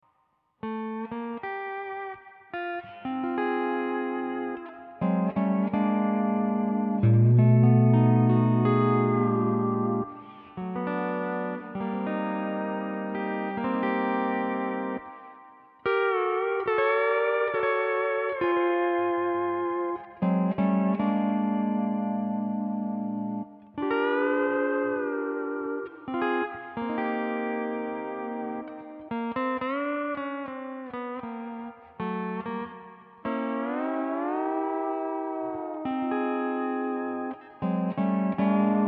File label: hawaiian piece